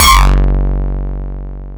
Metalen schop, FM; Polyend

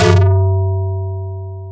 Digi schop, FM; Polyend

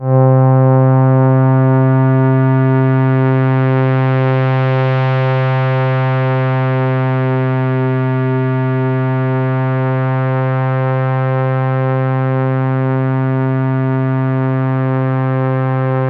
Stoffige pad, FM; Polyend

